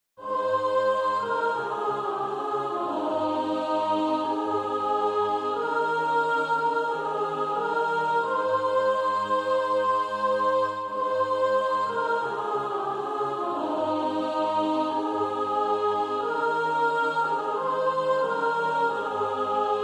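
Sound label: soprano; Pass Me Not O Gentle Savior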